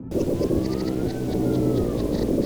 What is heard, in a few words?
hip hop alarm reverse horror; nova sound